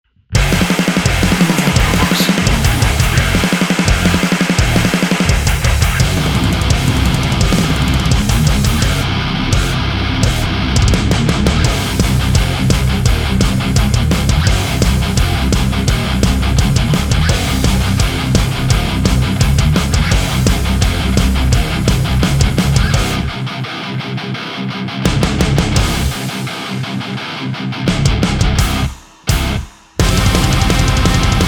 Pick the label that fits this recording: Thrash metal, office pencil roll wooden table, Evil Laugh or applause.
Thrash metal